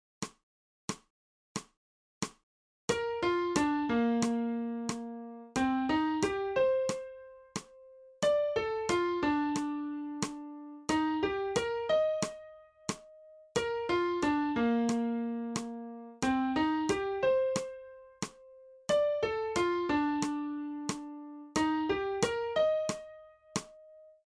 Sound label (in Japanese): 新フレットレス